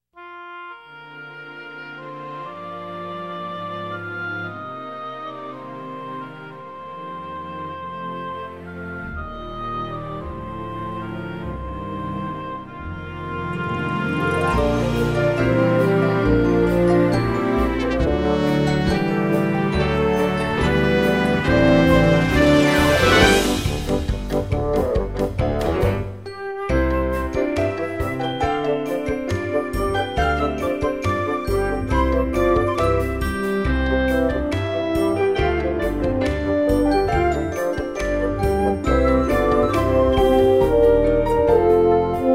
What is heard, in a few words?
Theme Song Instrumental